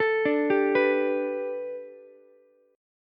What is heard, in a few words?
B Minor 7th Sharp 5th B Ukulele, chord; Bm7#5; arpeggiated; JGuitar